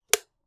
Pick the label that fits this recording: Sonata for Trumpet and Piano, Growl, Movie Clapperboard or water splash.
Movie Clapperboard